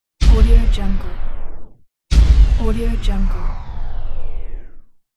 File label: stop or pause